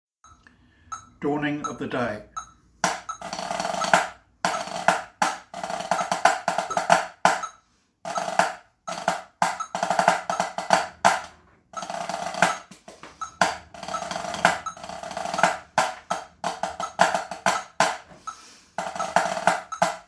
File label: dawning of the day drum, pipe band